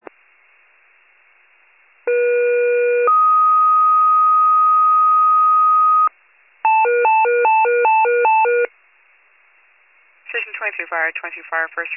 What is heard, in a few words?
FIRE pager alert